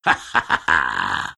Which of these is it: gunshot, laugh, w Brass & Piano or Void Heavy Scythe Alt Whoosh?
laugh